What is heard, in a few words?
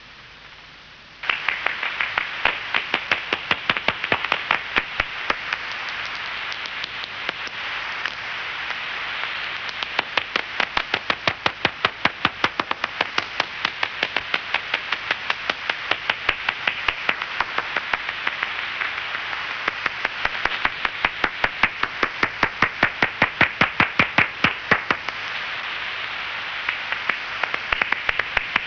brown long, bats